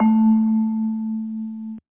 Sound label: key